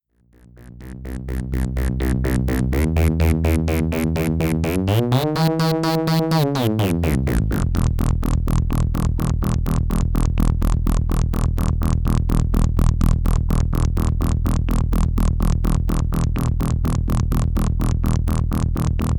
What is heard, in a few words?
Simple Sequ